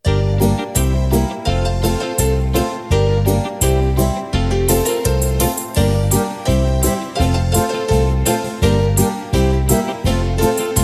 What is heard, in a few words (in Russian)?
nash orkestr instrumental